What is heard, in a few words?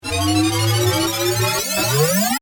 Power Up Telemetry, Ascend; Production, Element, Imaging, Accent, Transition; Tech